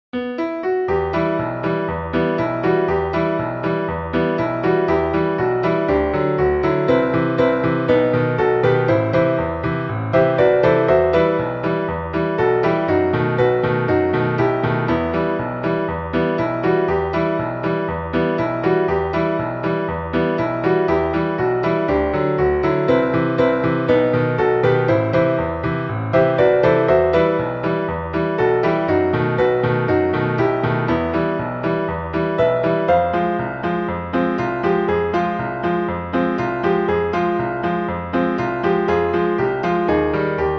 Bella Ciao Piano

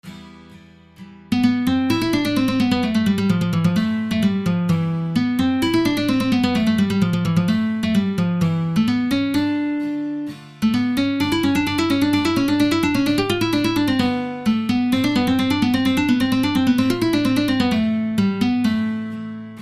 guitar

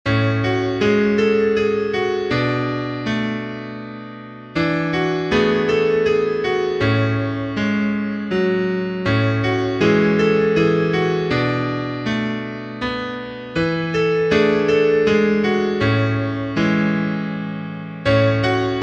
theme piano; Lala Land

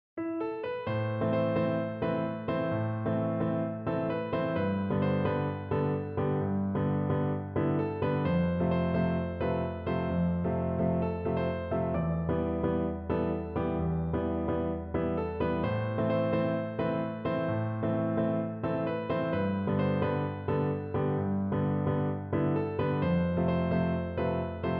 Piano

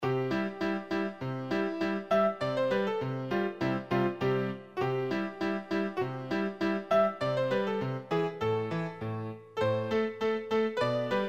Turkish March

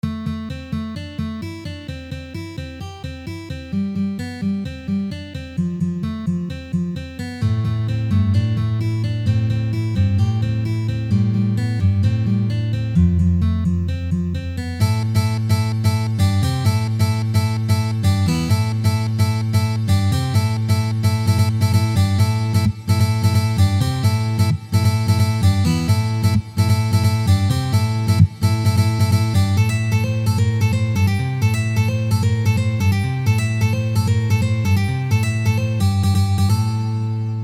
fingerstyle

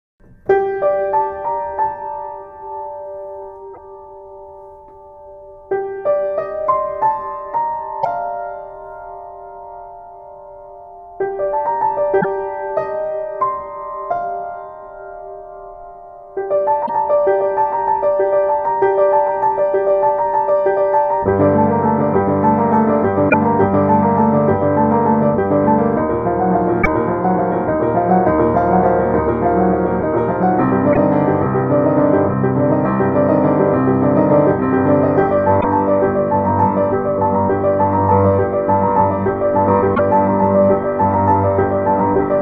Music on Piano; Walking Dead

